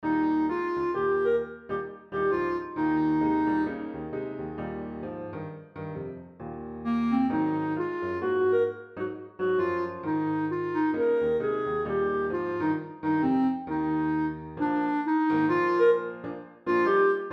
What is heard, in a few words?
Folk Shuffle